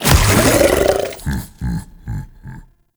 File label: Devour Regurgitate Impact B; Warframe; Grendel